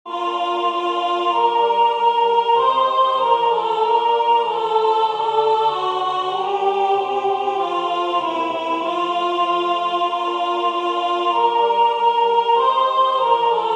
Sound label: deu messe alto